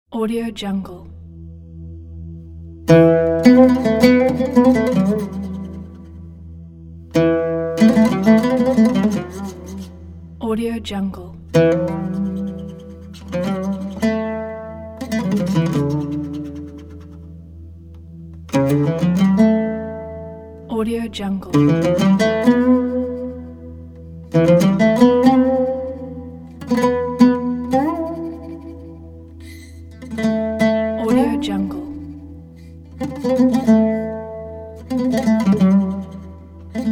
arabian oud improvisation

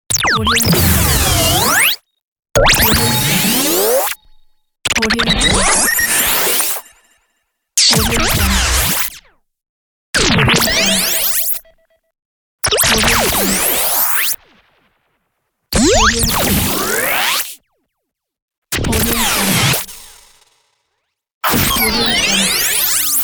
imaging radio effects

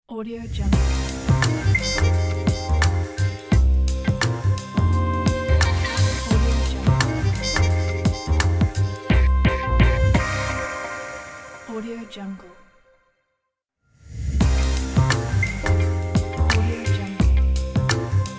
fashion week logo; milano

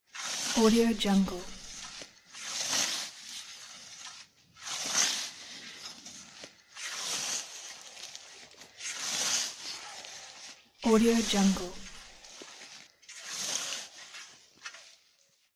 scythe

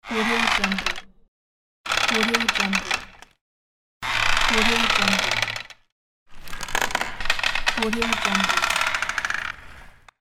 creaky horror door

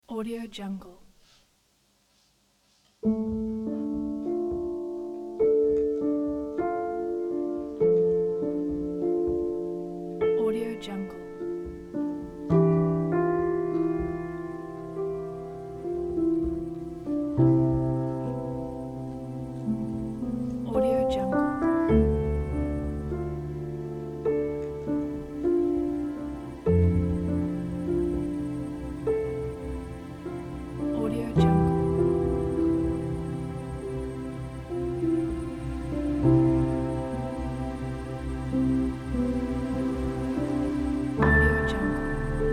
sad cinematic piano texture

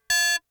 tonal beeps modulated ring various pitches ui; prosoundfx

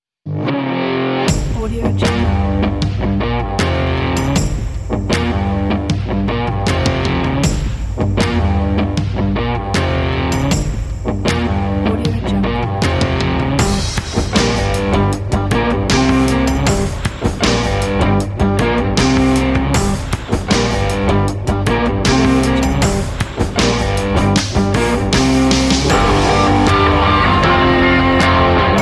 blues rock and roll

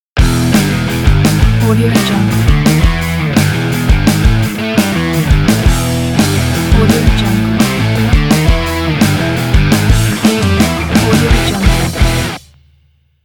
energetic rock opener logo

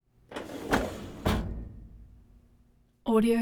metal desk drawer

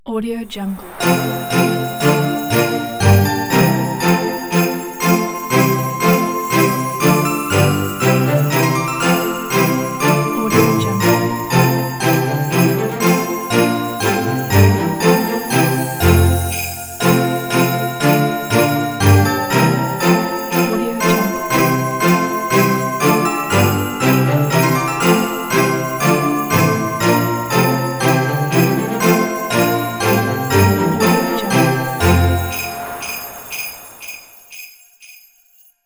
christmas song

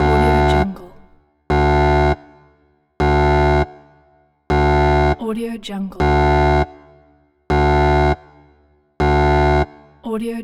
the alarm; fx-market

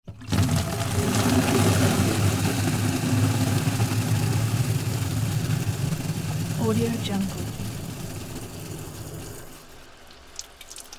large water bottle filling up in small bathroom

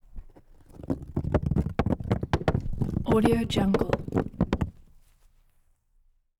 rock rolls down concrete ramp tumble

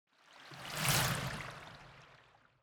elements water whoosh; smartsoundfx

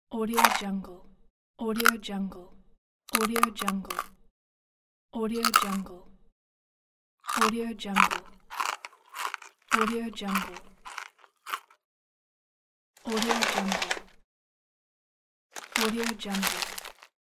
chips; teasound

